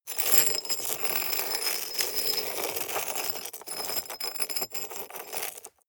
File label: scrape stone shard hard